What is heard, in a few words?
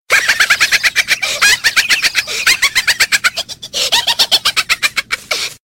Zozoo Sms